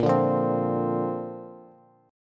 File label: Bb Major Add 9th, Bass; 18,17,15,17; strummed